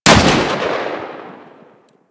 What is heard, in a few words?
Spark Rifle Shot; Grineer